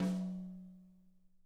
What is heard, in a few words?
tom, drum